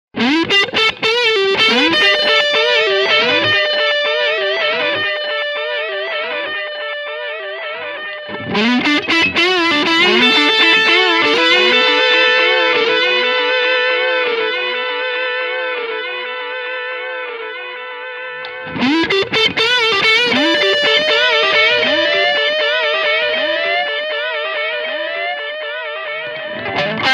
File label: Degrade